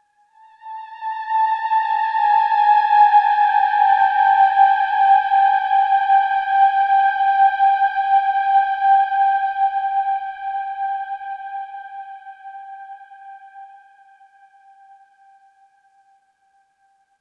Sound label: Oneshot Synth; Zombie Army 4 Dead War